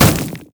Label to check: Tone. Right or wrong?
Wrong